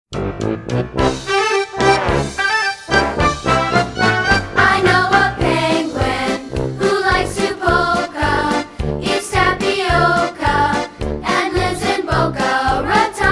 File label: polka